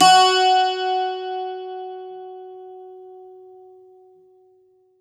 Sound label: bouzouki